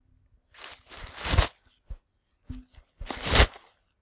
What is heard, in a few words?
paper; ティッシュを取る